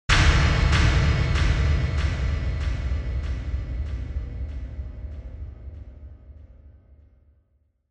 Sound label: Onoma-Impact (Low-Delay)